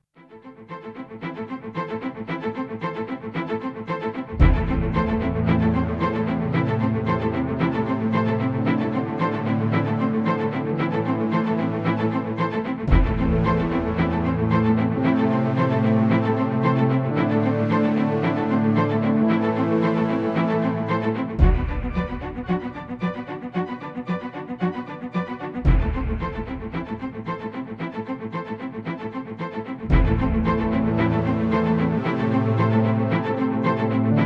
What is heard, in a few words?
trailer sound